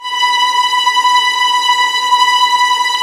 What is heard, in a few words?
STR TREM, Vlns Tremolo; Roland L-CD702